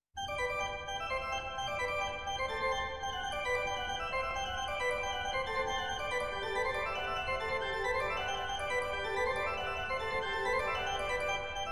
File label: Fantasia in G major, Piece D'Orgue, Tres vitement; Johann Sebastian Bach; Simon Preston; BWV 572